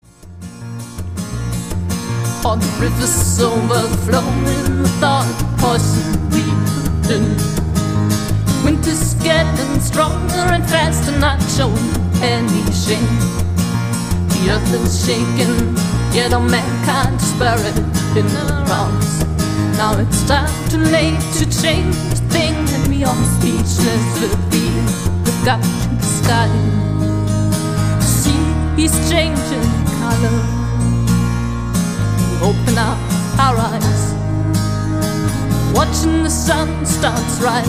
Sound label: footsteps